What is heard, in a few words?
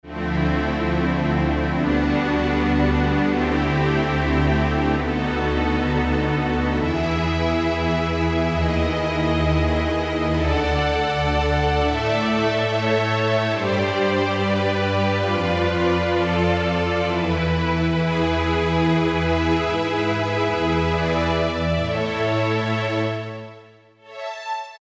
Stereo Slow; Generalmusic Equinox